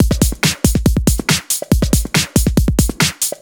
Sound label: Breakbeat 140 BPM